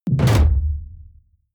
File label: sci-fi airship deck gun rapid burst